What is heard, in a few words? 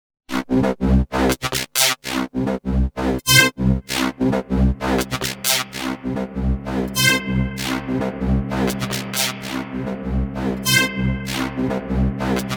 Spring Reverb Synth riff Feedback FX